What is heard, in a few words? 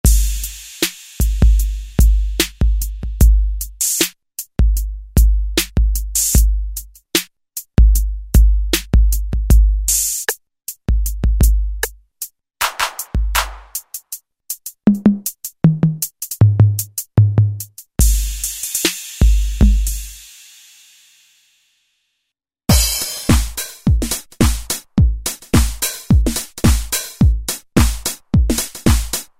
TR-808 & 909; Best Service Gigapack